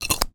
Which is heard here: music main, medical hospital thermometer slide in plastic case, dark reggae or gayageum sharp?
medical hospital thermometer slide in plastic case